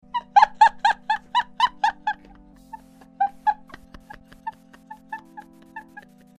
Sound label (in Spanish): su bonita risa de limpia parabrisas